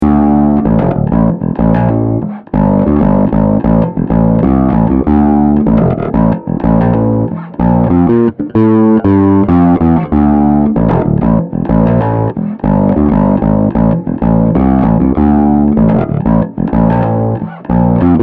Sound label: Bass Tight Drive Finger; Amptweaker